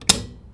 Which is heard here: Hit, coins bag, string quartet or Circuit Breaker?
Circuit Breaker